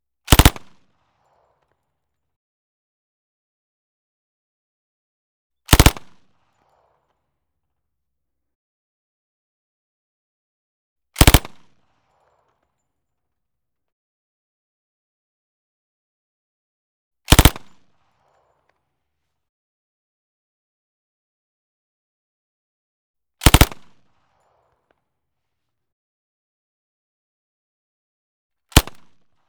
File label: 1928A1, Firing, Burst, 1m, Right, Above, Thompson 1928A1 automatic submachine gun; MKH8060; Pole Position